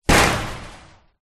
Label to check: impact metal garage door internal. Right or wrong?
Right